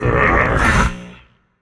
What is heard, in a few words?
ugh, voicepack; smite; jorm